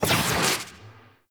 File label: Camera Spot Move; Warframe; Chimera Prologue